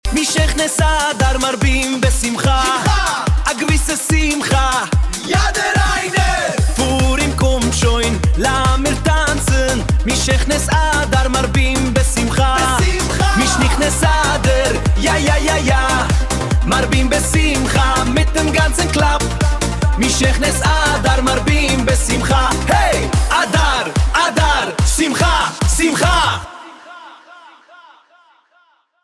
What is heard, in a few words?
galactic dance